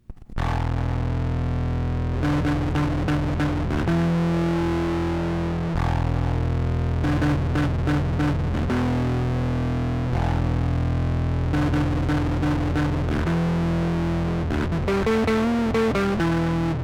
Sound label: 1N60+1N4002 Scream, Over Drive; シンプルなOver Driveの製作